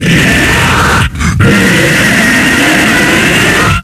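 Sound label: zombie death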